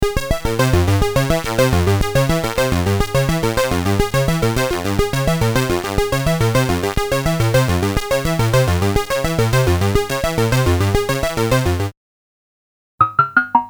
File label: Moog Modular